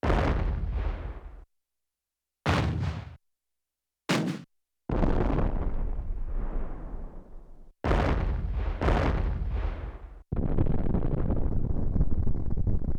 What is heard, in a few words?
explosion, Yamaha TX16W; Sound FX